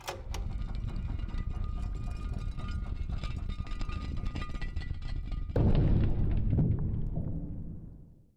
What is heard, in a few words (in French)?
Porte en pierre